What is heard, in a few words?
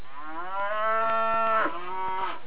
moo